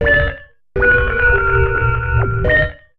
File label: SYNTH D+B 160 C, Drum+Bass, Keys; eJay